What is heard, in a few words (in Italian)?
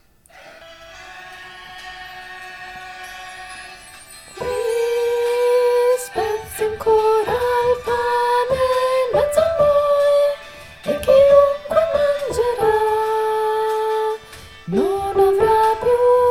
TEN su CORO